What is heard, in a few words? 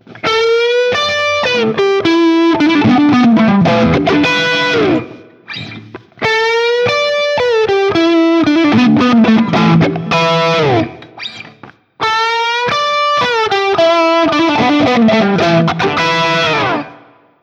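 Guild S285 JCM800 Riff, guitar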